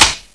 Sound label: clamp down, weapons